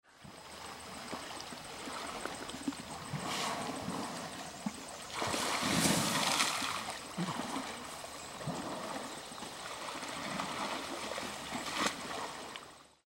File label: bubbles